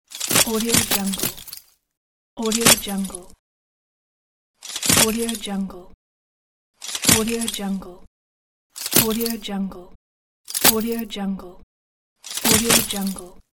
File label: falling equipment; teldrassil